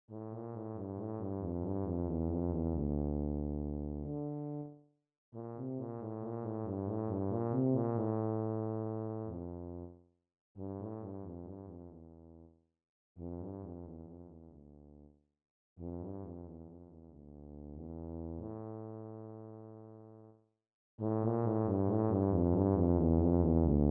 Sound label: Tuba